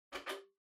Flip Clock Far Button Off

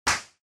Balloon Pop Dry

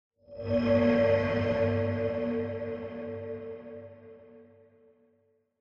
Horror Accent Low Short

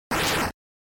Fighting Attack

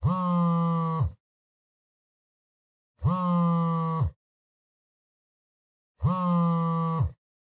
Cell Phone Vibration (Cushion)

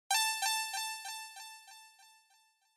Inspiration Low Delay

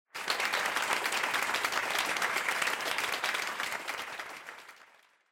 Applause, Far Mid

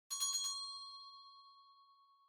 Winning Bell Far Strong

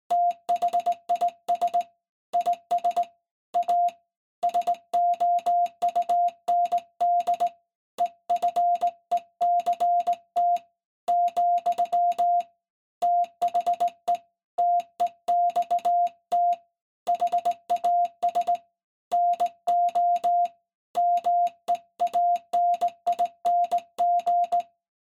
Morse Code Fast Key Noise

